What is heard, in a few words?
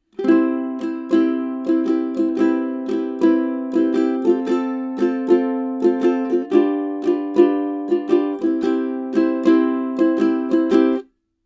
Austrian Audio OC18 Ukulele